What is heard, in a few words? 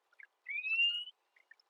Chirp